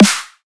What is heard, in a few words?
Dog Snare